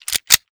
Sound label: p226 slide pull